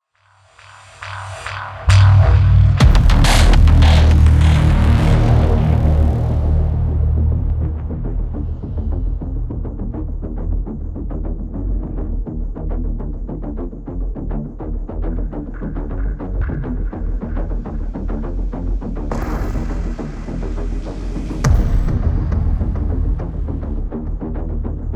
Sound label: PVP Main Ambient